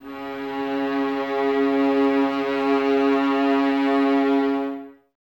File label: violas C#3, String Ensembles, Exprsive; Miroslav Vitous